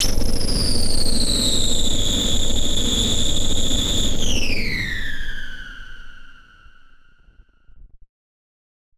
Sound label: Battered Synth; malfunctioning synth samples